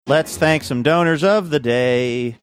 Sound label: singing let's thank some donors of day